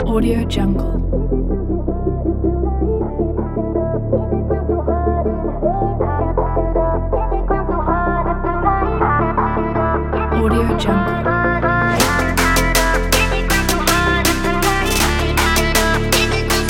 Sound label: future bass